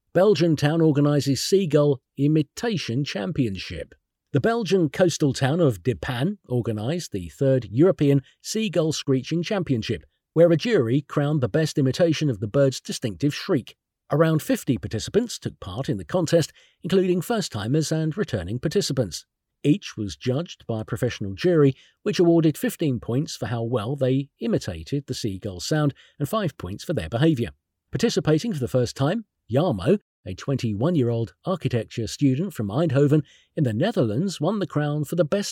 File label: Seagull Imitation; belgian town organises seagull imitation championship